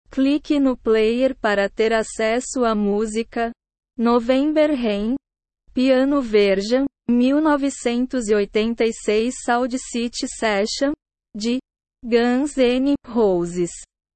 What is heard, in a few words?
piano version; 1986 sound city session